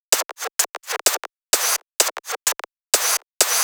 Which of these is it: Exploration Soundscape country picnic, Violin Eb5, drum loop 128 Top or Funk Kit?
drum loop 128 Top